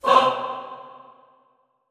FAH C3 L, Extended Classical Choir; Best Service

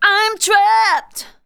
vox, Techno Trance; Ueberschall

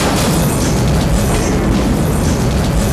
screamin; E-MU Formula 4000 Series Vol. 2; Dance Hits

